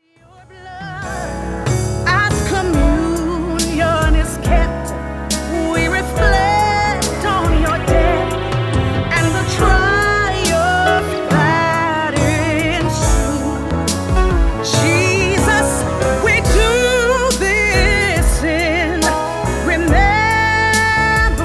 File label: Soprano